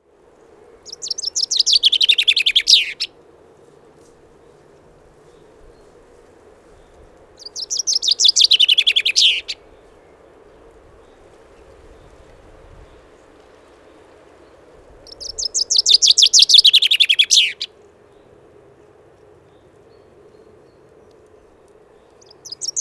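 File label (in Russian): zjablik, common chaffinch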